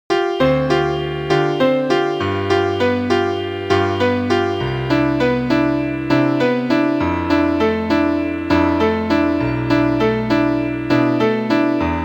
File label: Montuno Circles Blues Scale no chords